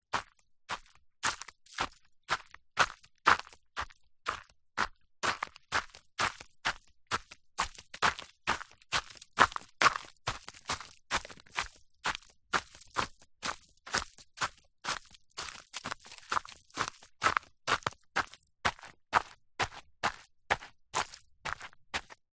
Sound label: foley footsteps walking on gravely path in soft shoes; zapsplat